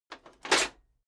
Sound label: metal plate armor movement single